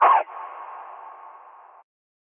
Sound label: Racked Chant; drums; hip hop; STYLE KITS (Hip Hop, Etc); Khezie Hip Hop Kit